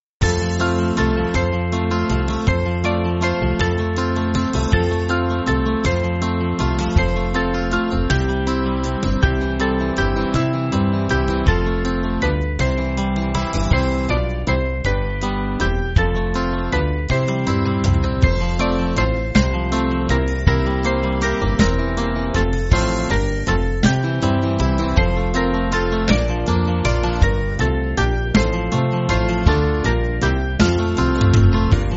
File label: We Are Your Little Ones, Band